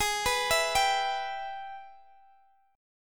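Ab Minor Major 7th Sharp 5th chord, Guitar, Standard tuning; arpeggiated; x,x,18,16,17,15; JGuitar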